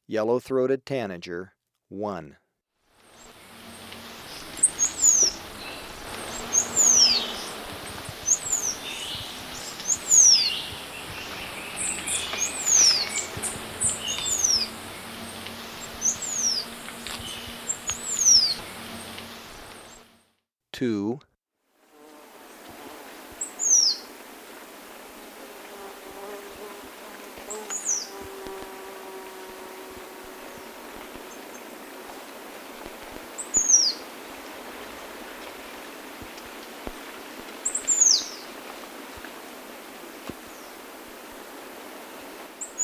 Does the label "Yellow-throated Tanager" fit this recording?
Yes